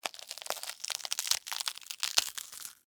Crunch Tear Slow, Cabbage, Head, Vegetable; Texture; BlastWave

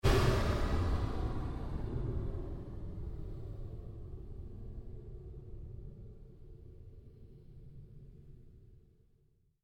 Created Pulse Fade, Rise And Dive Sound Effects

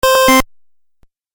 Beeps Video Game

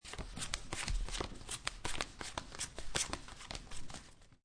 Playing Cards Dealing Cards On Felt; Casinos

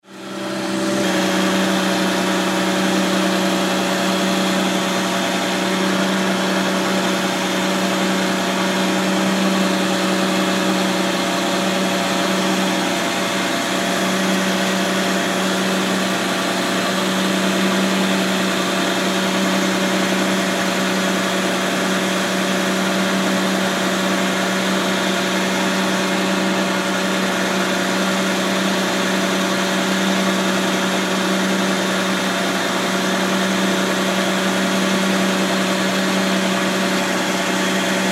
Fuel Truck Idle Fueling Ambience; Transportation Aircraft; Pa Announcement

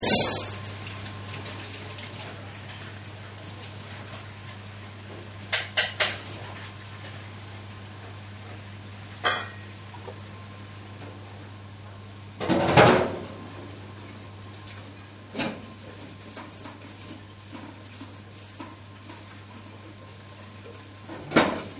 Dishwasher; Dishwasher Seq; Appliances; Household; Partners In Rhyme

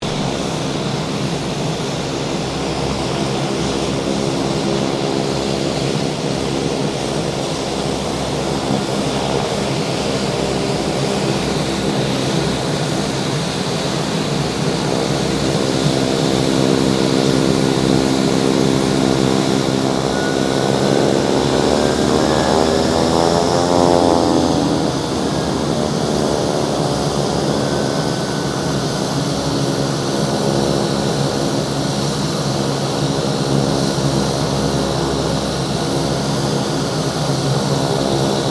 AIRCRAFT PC-9A SQUADRON 5 TAXI; Roulettes air display; Airplanes; Vehicles

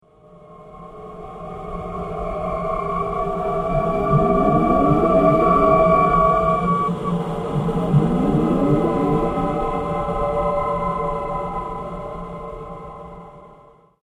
Alien Ghost Threat; Alien Sound Effects; science fiction sound effect; Andrew Fleming; Twistedtracks

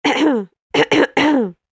Woman Clearing Throat; human sound effect

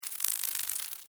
Insect, Wings, Movement, Shake, Rattle, Bug; Buzz; Wing Sound Effects; Wings (Sound Effects:Animals:Insects); Blastwave

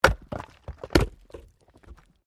Wood Chopping Axe

